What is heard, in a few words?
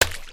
paint splats; build mode